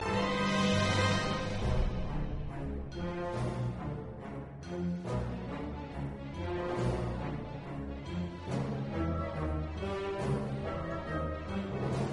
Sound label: stealth tension, music; star-wars-rogue-one-boots-on-the-ground